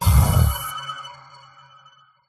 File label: Fire Spell Lingering Flames Debuff; sound spark